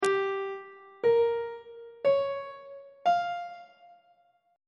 c phrygian 7th chord 5 is g half diminished 7th on treble clef